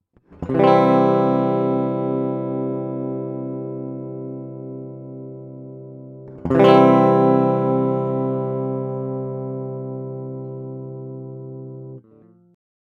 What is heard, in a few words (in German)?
Gitarre Arpeggio